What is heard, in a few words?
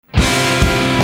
warm machine